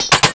shoot end, weapons; thanatos9

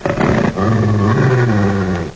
zombie dog growl; zombiebot

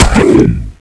normal skill, zombie; ZombieDarkness

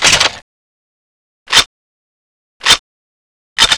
reload; infinityex; cstrike

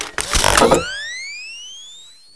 clip in, weapons; plaunch

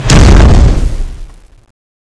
zombie attack; cstrike; CSO LightZombie

